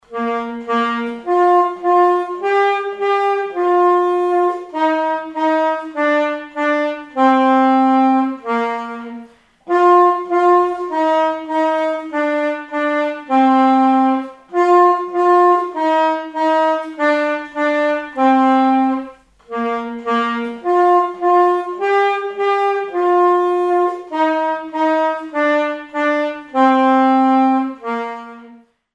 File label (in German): Saxophone und Querflöten; Morgen kommt der Weihnachtsmann; Fortgeschrittenenkurs Klasse 5-6